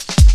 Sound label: Drum Loops, Jungle, 166.5